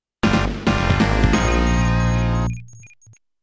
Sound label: Stage Clear; Shinobi III